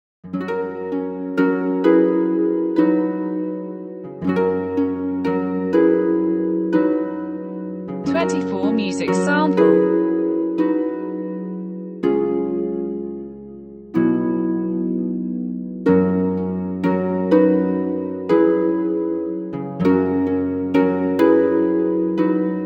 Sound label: harp sample